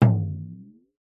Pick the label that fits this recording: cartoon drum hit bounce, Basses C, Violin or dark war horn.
cartoon drum hit bounce